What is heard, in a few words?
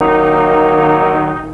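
synth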